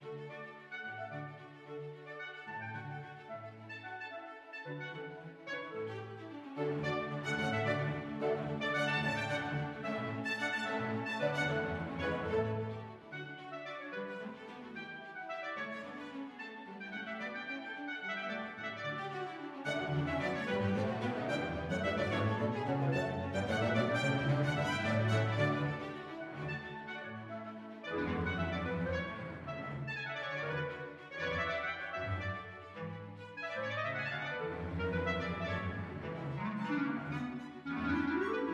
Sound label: Clarinet Concerto 2nd Movement, Classical music; Mozart